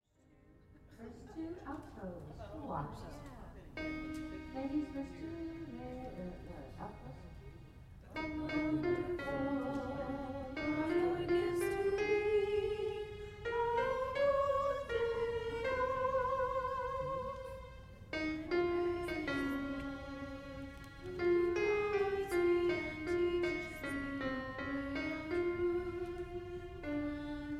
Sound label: altos; verse 2 and refrain; Gather Hymnal